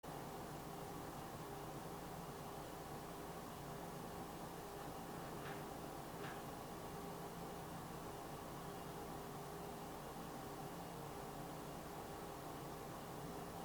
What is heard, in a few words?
floor creaking; EVP; Devoe House